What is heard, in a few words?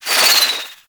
Bow Arrow Rain Whizz By; Madurai; Focus; Ultimate Abilities